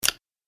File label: Multimedia Button